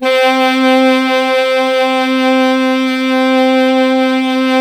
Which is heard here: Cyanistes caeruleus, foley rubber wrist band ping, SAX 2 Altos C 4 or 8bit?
SAX 2 Altos C 4